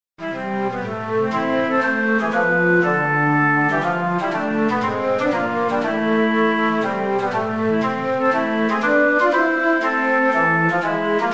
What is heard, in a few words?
Mazurka; Puccino